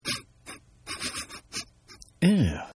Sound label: Sniff Eeew Male